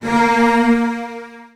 A#2, Virtuoso Strings, 16TH; ILIO; Kirk Hunter; CL 16TH

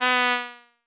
U220 FX Set, Drum Machines; Roland U220

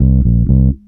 HEY, Roots Disco; USB Soundscan vol.32; AKAI

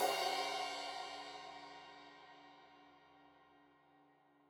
Crash 15in L edge, Cymbals Stereo

